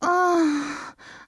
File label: groan